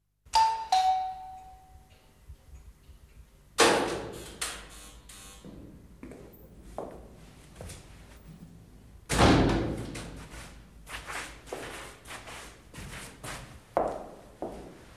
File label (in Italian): CAMPANELLO PORTONE